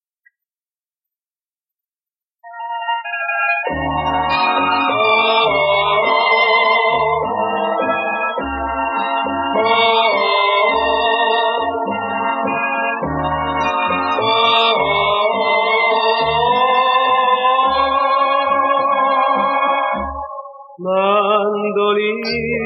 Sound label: MANDOLINO MANDOLINO